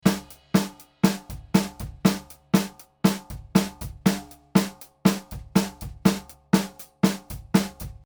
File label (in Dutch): Snaredrum probleem; 1a. Polariteit-probleem (snaredrum); fase-problemen in de studio